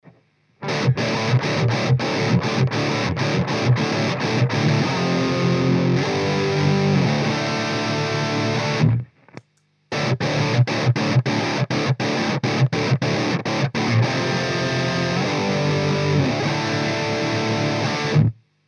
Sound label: REVV G series Riff C